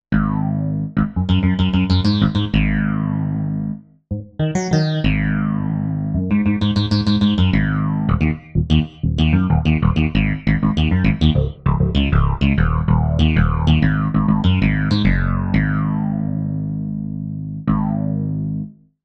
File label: Rezzzz Bass